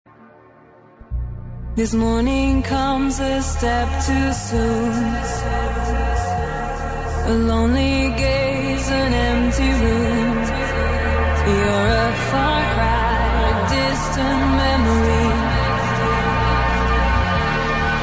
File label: Progressive Trance